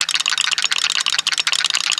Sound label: Platform Travel, ambience; ninjago